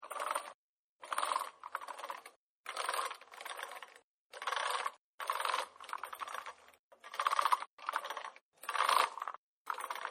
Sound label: Hoist Chain and Rope, Machinery; Machines